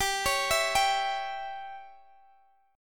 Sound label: C sharp Diminished